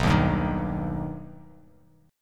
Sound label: B Augmented B, chord